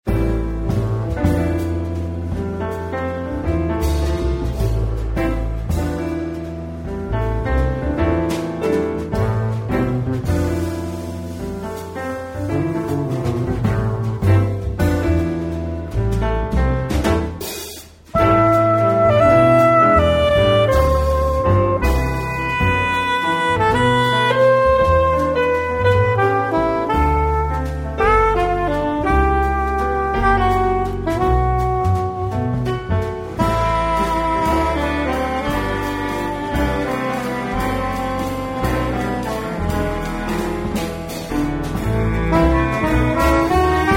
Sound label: Stately Waltz